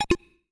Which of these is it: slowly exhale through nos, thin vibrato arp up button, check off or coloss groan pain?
check off